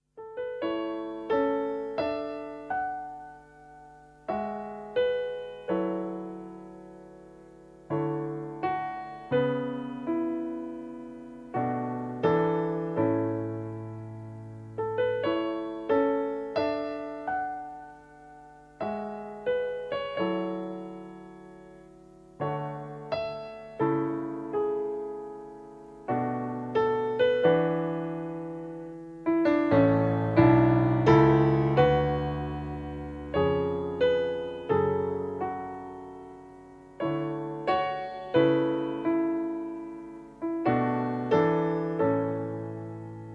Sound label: piano